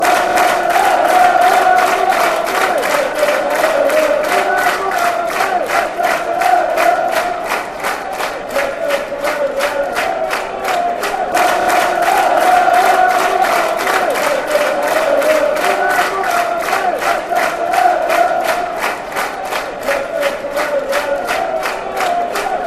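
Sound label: fanchants, football chants; de de de de dud ded dur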